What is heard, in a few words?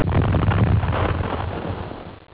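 EXPLOSION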